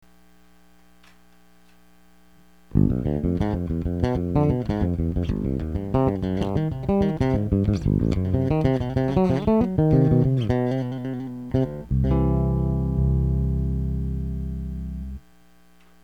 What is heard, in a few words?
Bass trial run